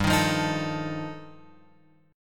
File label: G 7th Suspended 2nd Sharp 5th G chord; G7sus2#5 Chord {3 6 3 X 4 5} strummed